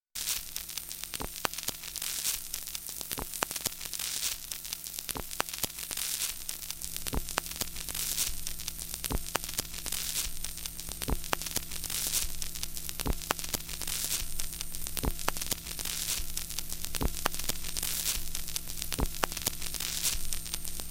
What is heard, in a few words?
Rua da Alfandega, Lisbon